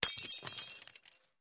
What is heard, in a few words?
Glass Breaks Distant; windows & mirrors